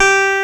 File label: HONNER G4, Keyboards Old School; USB Soundscan vol.09; AKAI